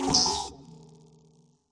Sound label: virus glitch, Electro Hits